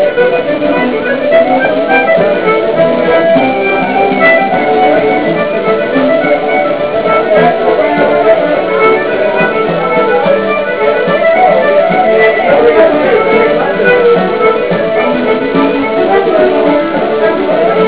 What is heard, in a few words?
bar music; eire